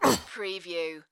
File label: Pain Umph, Male Painful Grunt, Hurt